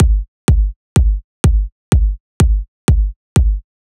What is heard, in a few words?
Big EDM Kick Loop, 128 BPM; Future House Tools